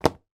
game monopoly game card pack place down on playing board